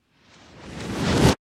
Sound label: Wind Blast Build Up